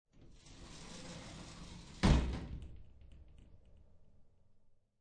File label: Shower Sliding Glass Door Close, Household, Shower, Sliding Glass Shower Door Close; Bathroom